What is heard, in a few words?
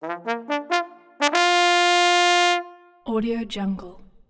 music cavalry charge; prosoundfx